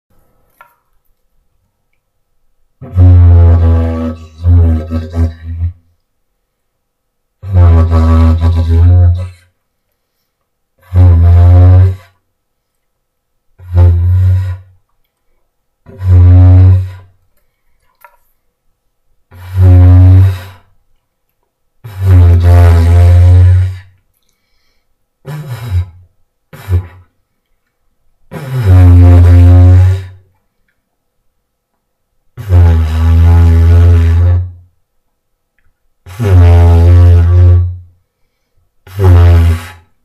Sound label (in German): Didge Versuch